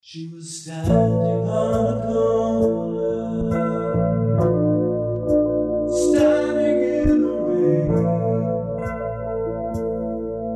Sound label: Pop Adult Contemporary, From Cassette